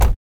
Car Door Slam

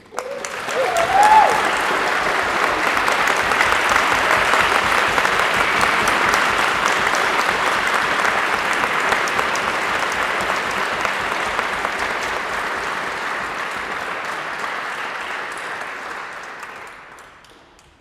Wedding clap

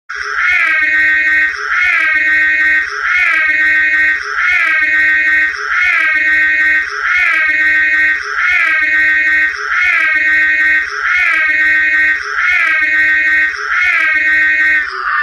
Siren Long; Ecto 1

